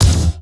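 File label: DRUM HIT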